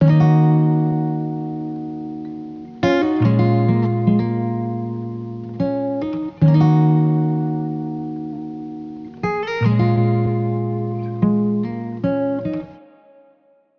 Acoustic Guitar Loop Wet 75 BPM D# Min; Melodics; Cymatics; Eternity Sample Pack; Guitars - Acoustic

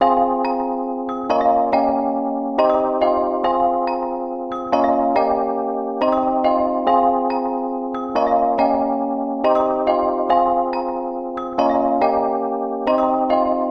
Melody Loop - 140 BPM G# Maj, Hip Hop; Cymatics - 9God Hip Hop Sample Pack

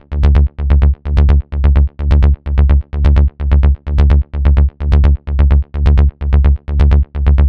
Bass Loop D 128, Melodic Techno; Fare; Ghosthack; AC2024 - Day 12 - Melodic Techno

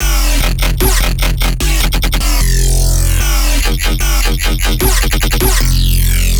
Bass Loop F Bass Gun, Dubstep, 150bpm; Ghosthack; Dubstep Sound Arsenal

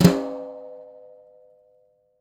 Maple Brush Flam, Snares; Drums; Ghosthack - Free Snares 2023

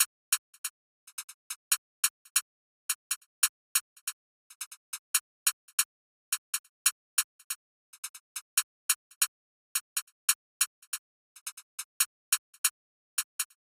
Drum Loop - 140 BPM Hihat; Loop Stems; Cymatics; Mystery Pack Vol 4; Birmingham

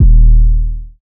808 D#; Cymatics; Oracle Sample Pack; 808s

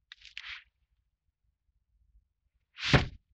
Swish Broom Hit, Vintage Cartoon

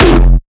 kick oneshot monster F; GhostHack

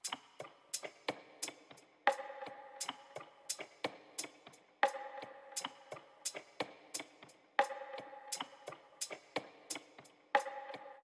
Percussion Loop, 87 BPM; Summer; Percussion Loops

